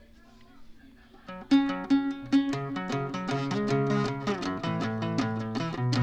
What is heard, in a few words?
insturmental; kicking and screaming